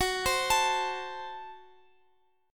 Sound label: Gb Diminished, down, Guitar, Open F